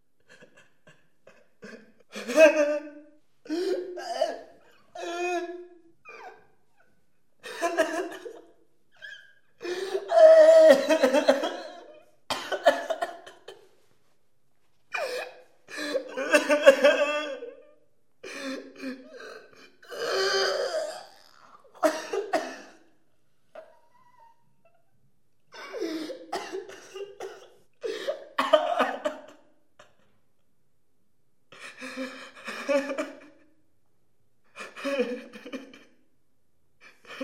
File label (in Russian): plach i kriki v palate psihbolicy; Звуки сумасшедшего; идиотские звуки, которые издают психи в фильмах страшилках